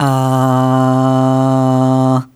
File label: Aah Short C2 Dry